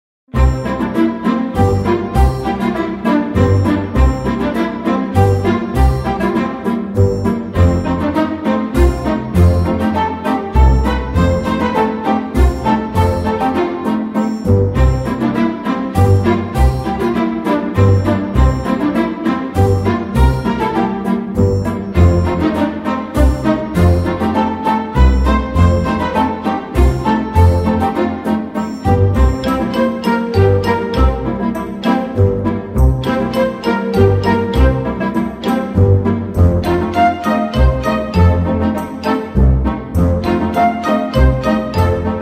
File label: RINGTONE, ALARM